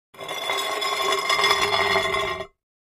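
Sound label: Large Tile Square Scrape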